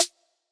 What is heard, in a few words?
hip hop 808 snare